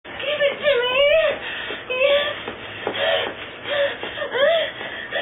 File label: moan give it to meeeeeee